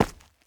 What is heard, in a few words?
Foley Footsteps Rocks Sneaker Jump Land On Two Feet Close